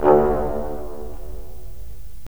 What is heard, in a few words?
BRS, Roland LA Composer